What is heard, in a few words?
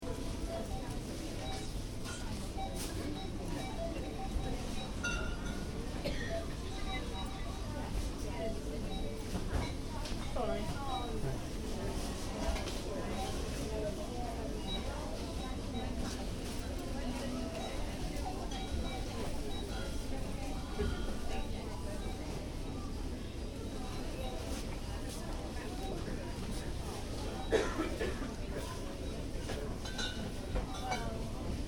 Interior supermarket, Supermarket, Interior: Ambience, Tills Beeping In Background; Stores and Malls (Sound Effects:Backgrounds; Stuart Duffield